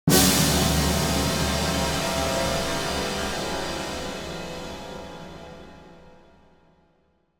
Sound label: Symphonic Hit In Danger - Symphonic; Hollywood Edge; sound designer's toolkit v2